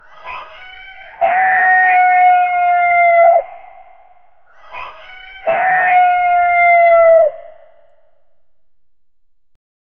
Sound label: mix between an elk call